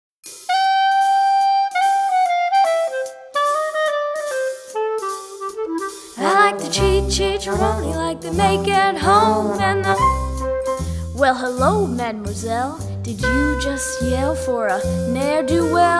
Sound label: guide vocal; omalley enters